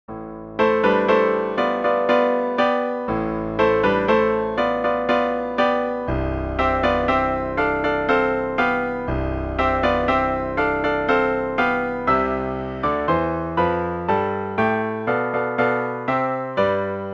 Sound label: Fanfare